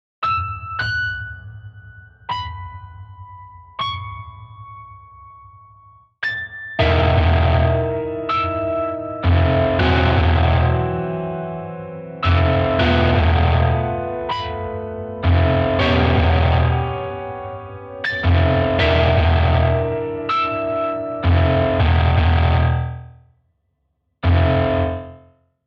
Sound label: piano